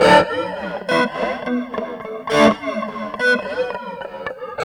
GUITAR; East West; Phat & Phunky

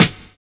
HORSE BEA; Univers Sons

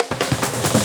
Fills, Loops 140 BPM

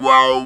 Talk Box "wow"

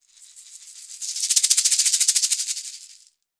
SHKR LEG; ILIO; Synclavier Percussion 2; World & Orchestral

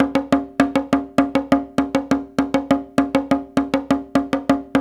Haitian Congo, World Dance

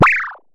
Synare Filter; Star Instruments Synare 3

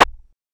Perc, Rattlesnake Parametric Percussion System; BME Rattlesnake Parametric-Percussion-System

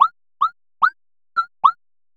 Synth Pattern; Electrocution

